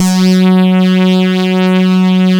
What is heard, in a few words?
Saw Lead F#; SYN JD 2; Best Service Gigapack 1